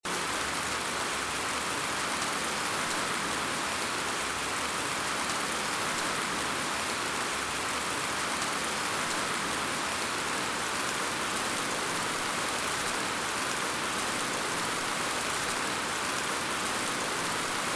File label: Rain Sounds Wonderful Bedtime Rain Sound